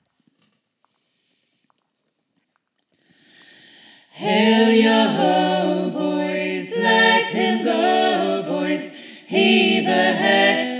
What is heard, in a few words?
mingulay all